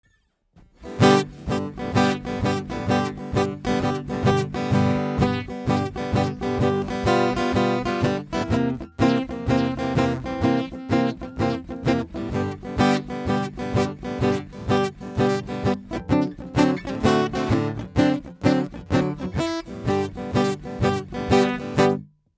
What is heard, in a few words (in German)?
Cranborne EC 1 Gitarre Original